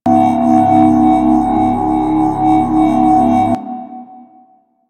Hub Hologram Display Loop; Warframe; Tenno Hub

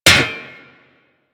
Melee Hammer Impact Generic

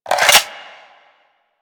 Reload, Weapons; Strun

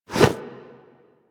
Holster Weap; Foley; Warframe; Tenno

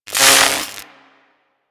Lightning Rifle Spark B; Crp Chain Lightning Rifle

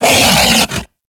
Kubrow Feral Vocal Melee Attack; Warframe

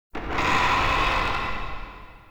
Ancestor Tree Wood Creak; Soul Plane

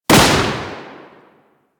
Sapient Pistol Shot; Warframe

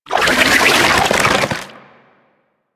Key Pieces Tumor Shell Attach A; Warframe; Infested Eris; Encounters

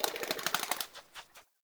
Wetland Forest Bird Scatter; Ambience; Day

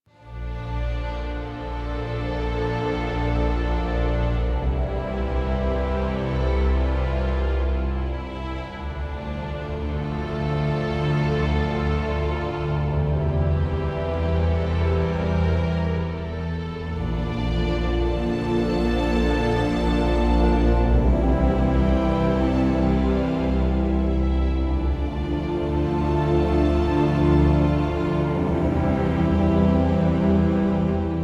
Music Player Cue; Orokin; Warframe; Dojo

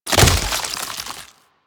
Tree Seed Splat; Ambience; Warframe; Infested Eris

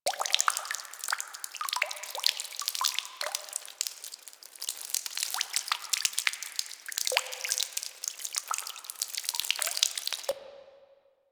Ocean Drip Stream Small Loop; Ambience; Props; Warframe; Grineer Ocean

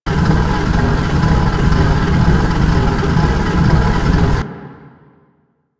Gas Machine Loop; Ambience; Warframe; Corpus Gas City

